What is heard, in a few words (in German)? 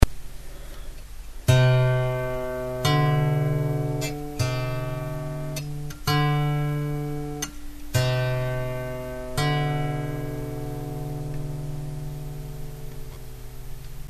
gr sekunde, musik